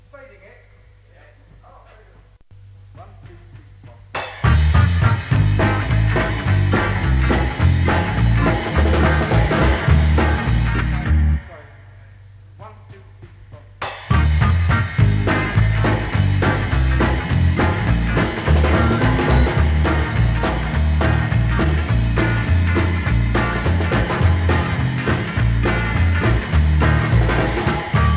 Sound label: backing track